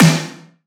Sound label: snare; Slate; SD MIDNIGHT Sample Pack; roomfaze